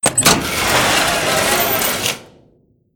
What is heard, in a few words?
Hatch Open